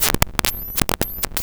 Packing Peanut Rhythm; Cwejman S1; DevSnd Cwejman S1 - JK

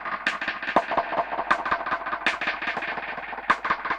Dub Drums B Echo Snare 120bpm; musicradar

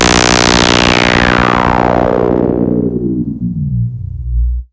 OSCILLATE, OSCILLATION; Zero-G Ambient 1

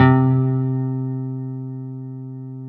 AC GUIT C2, AC.GUITAR; ACGUITSF

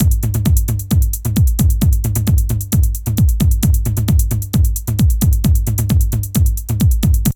DRUM, 132; DRUM LOOPS; Megabass Remix! 2

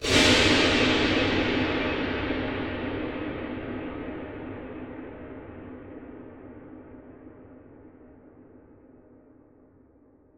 Huge Verb Tail, Long Reverb Tails; impact samples

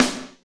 SNR, R&B Kits, Roland; Northstar - Drumscapes Roland; Wet Kit

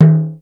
Perc, EMU Proteus

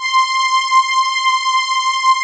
FATTY STRNGS; Pro-Rec - Synthsonic; Z1 PADS

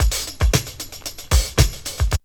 break; Zero G - Man Machine

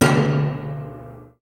HIT, Orch Kit; Roland LCDP03 Orchestral Perc; Orch Combo 1

